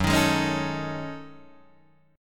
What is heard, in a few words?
F sharp Minor 6th Add 9th, Guitar, Open D